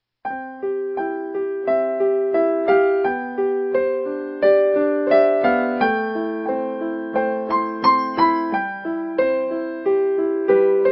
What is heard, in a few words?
blessing song; 祝福